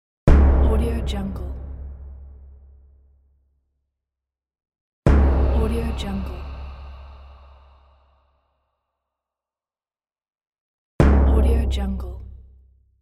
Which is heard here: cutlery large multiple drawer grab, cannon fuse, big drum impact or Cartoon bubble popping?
big drum impact